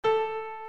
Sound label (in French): la3